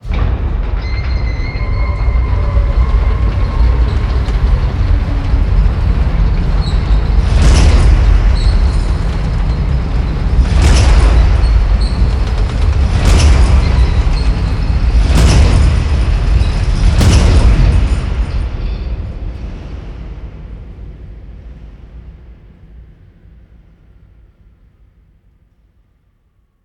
Solution Ladder Down; moul

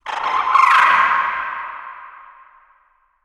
Timid; moul; Bahro

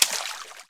Water Run; moul

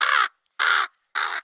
Bird; moul

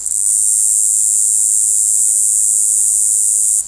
Desert Cicada Loop

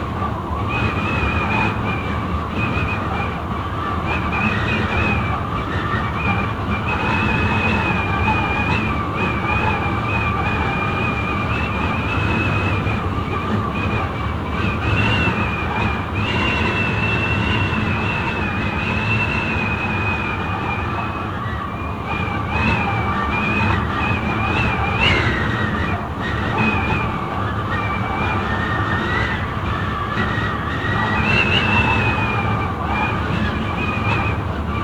Window Wind loop

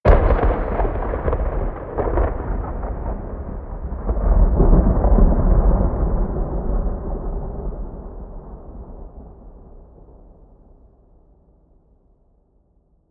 Thunder; moul

